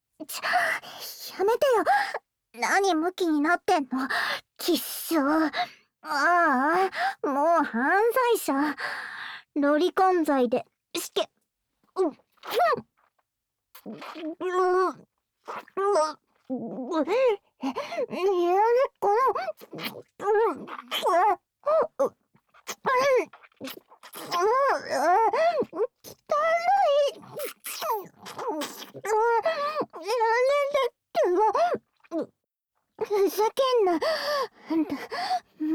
ディープキス (ディープキス、乳首いじり), ASMR; ぷちどるとディープキス（ディープキス、乳首いじり）

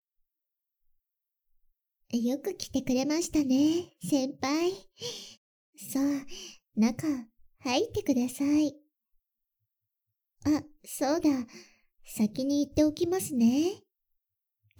脳じゅわ耳舐め・耳舐めと指で両耳責め・ベロキス・中出し・オホ声 効果音なし; 専用おま○こ堕ち♪裏アカ女子にお仕置き生ハメエッチ

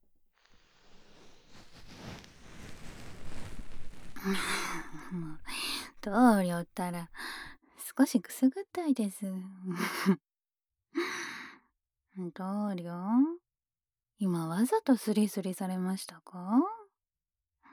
右耳かきパート, ASMR

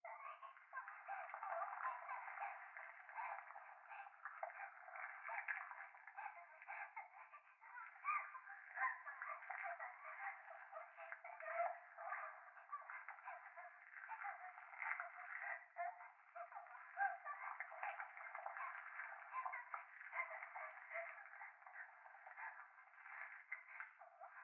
風呂 可愛い喘ぎ 触手

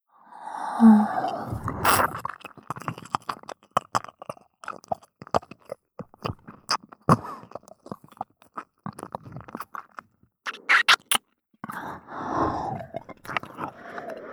右耳舐め, ASMR

